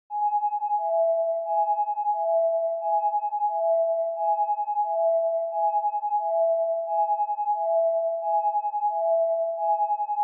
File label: Ambulance Siren (Far)